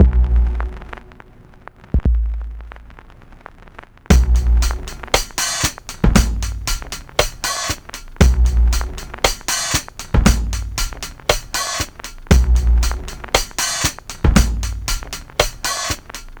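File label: drum, 117bpm, Breakbeat; Angel 117bpm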